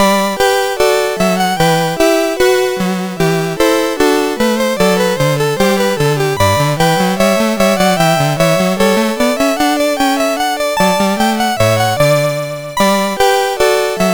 gavotte, MOS6581R2

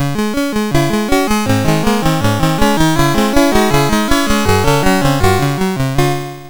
MOS6581R2, sid; Rupert and the Ice Castle

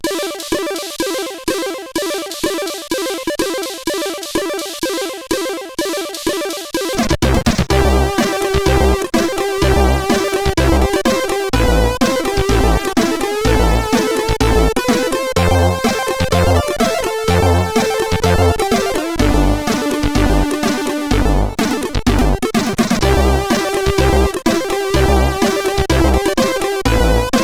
CSG 8580R5

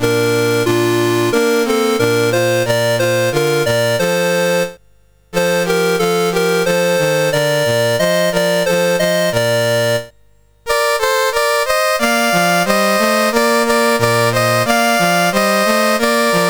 Lesotho, National Anthems, CSG 8580

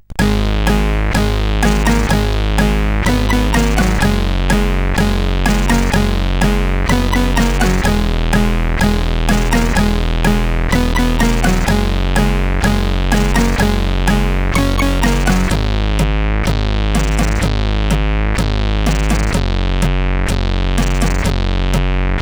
Intro, CSG8580R5; Falcon Intro 03 v2

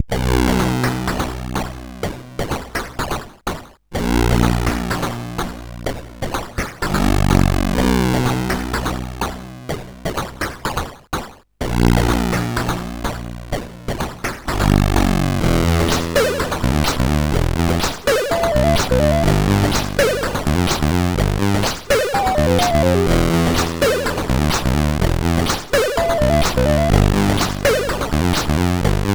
sid CSG8580R5

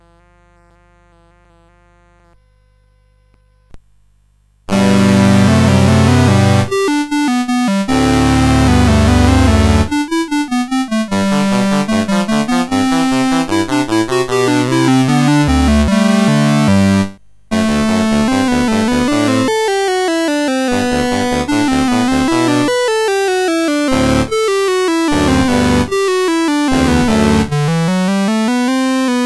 Sonatina in G, CSG8580R5

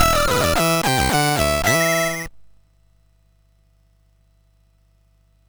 sid MOS6581R4; Dark Lord

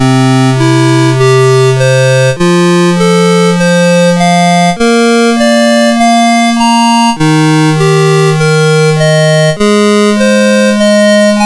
arpeggios, MOS6581; MOS6581R2

